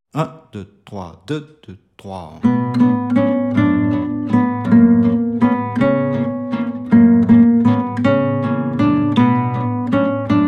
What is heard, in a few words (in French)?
160 bpm, guitare